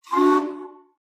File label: SHIP STEAM WHISTLE; boat horns